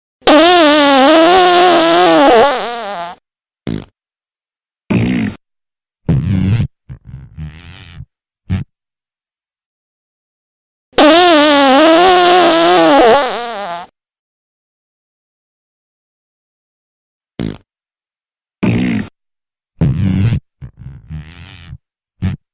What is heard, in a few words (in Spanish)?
sonido del pedo